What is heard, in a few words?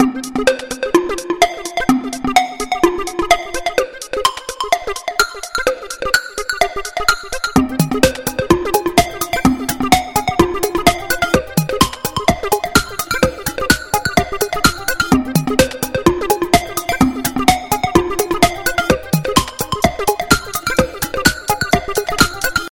android phone ringtone